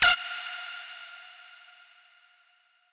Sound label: lead